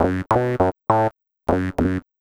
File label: Squibble, Bass; Sonic Foundry (Sony Creative Software); Synchro Funk